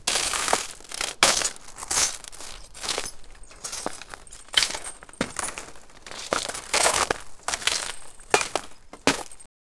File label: normal paced footsteps, ruins